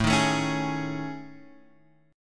A Major 7th Flat 5th, Guitar, Open F; AM7b5 strummed; x,4,3,4,4,3; JGuitar

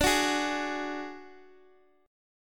D Major Flat 5th D Guitar Open D, chord

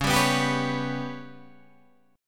B Suspended 2nd Sharp 5th

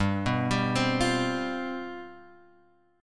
G 11th G, chord

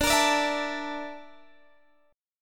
A sharp Major 7th Flat 5th D

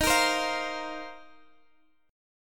A sharp Suspended 2nd Suspended 4th D sharp

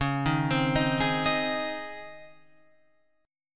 C sharp Major 7th Suspended 2nd, Guitar, Open F; C#M7sus2 arpeggiated; 13,10,12,10,11,10; JGuitar

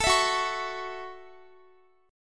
F sharp Minor 7th F sharp, Guitar; F#m7 strummed; x,x,19,21,18,20

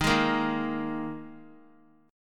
D sharp Suspended 4th; D#sus4 strummed; JGuitar

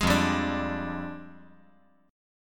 F Minor 6th Add 9th F down

